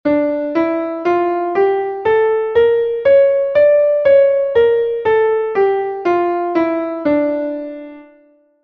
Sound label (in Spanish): re menor armonica